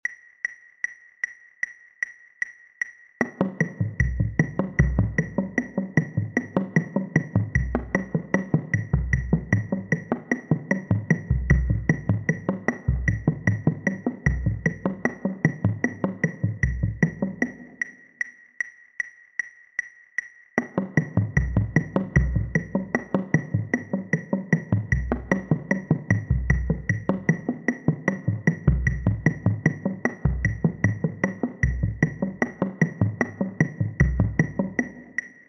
sequence bass

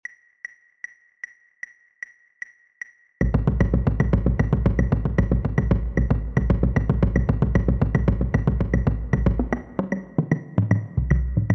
double bass

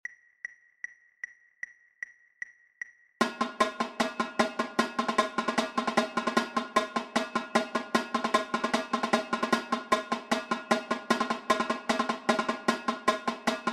sequence para tenors

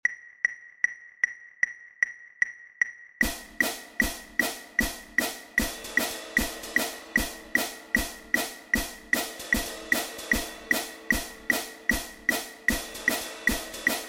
sequence flam cymbal

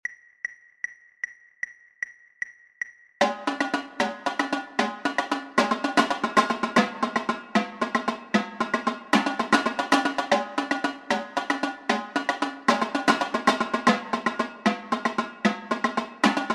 sequence flam tenor

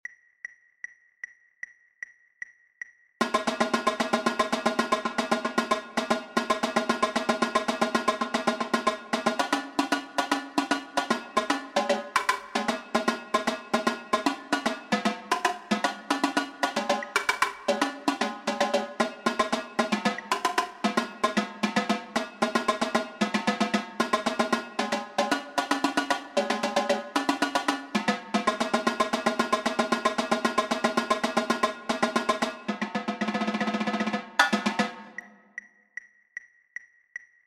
sequence double tenor

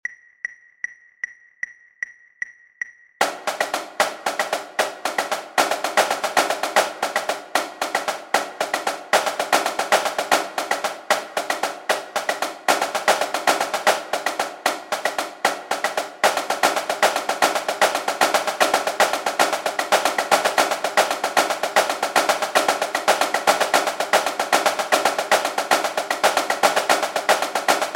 sequence flam snare

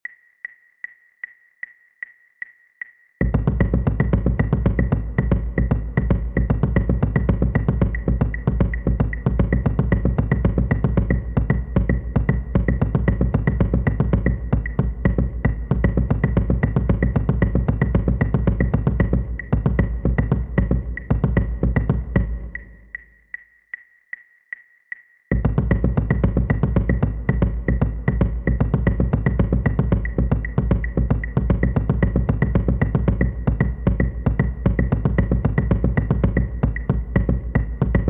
timing bass

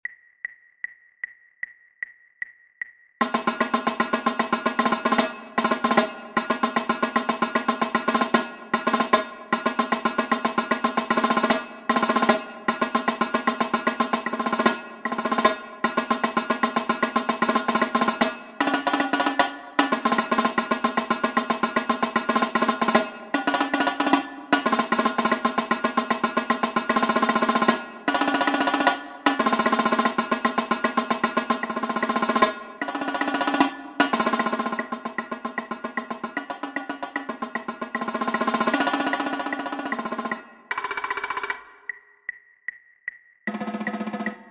trip tenor

